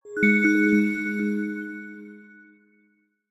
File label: multimedia game sound relaxing game soft twinkling chimes ping